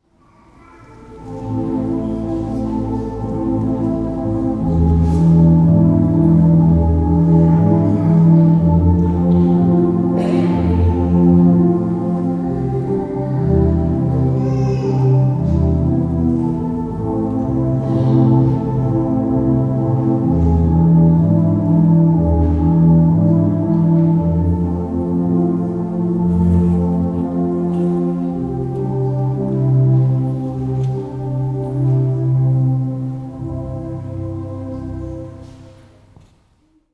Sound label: Instrumental; St Jo